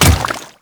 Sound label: Grineer Royal Guard Water Step, Footsteps